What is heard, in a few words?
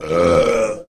burp short